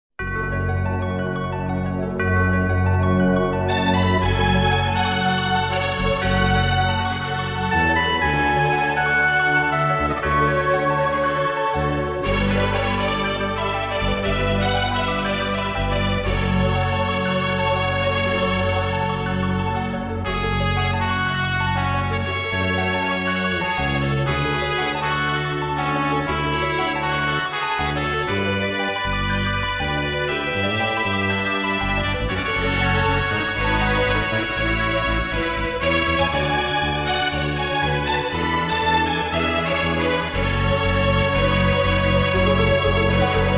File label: bgm